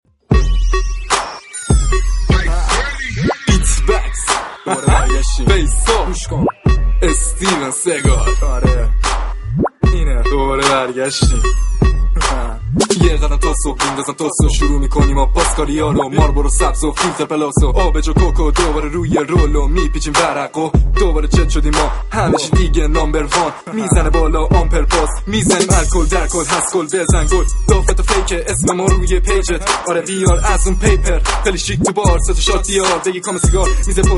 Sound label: Beat